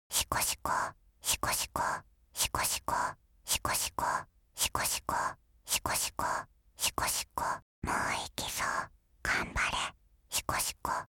しこしこ loop (バイノーラル), ASMR

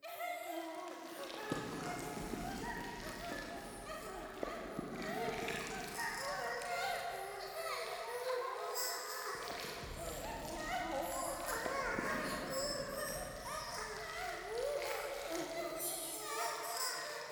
喘ぎ 触手

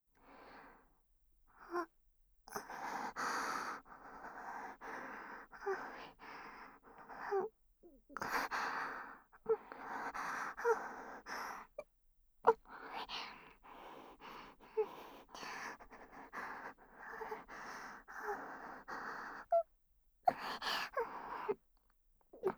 新人メイドと生ハメ添い寝

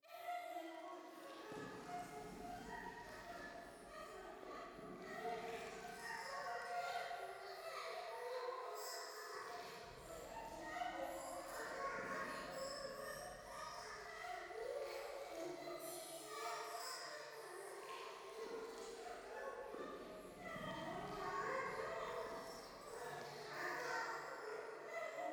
夢の中 喘ぎ 触手

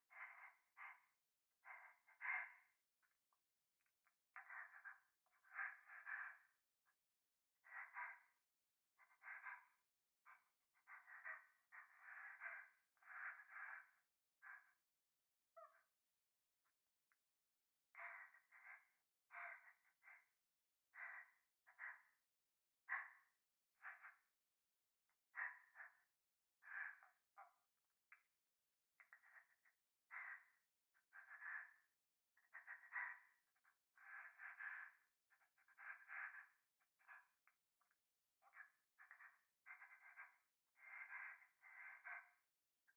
風呂 左耳快感吐息

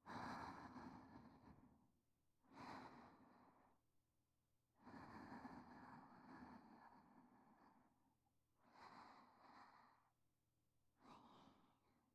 寝息; SEあり

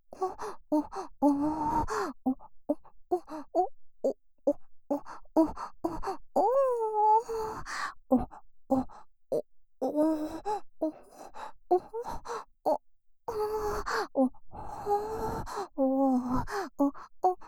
オホ声

